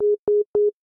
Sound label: factory reset starting, homepod